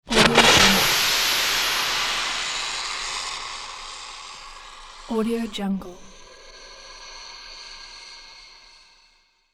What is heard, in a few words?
space dragon spit and hiss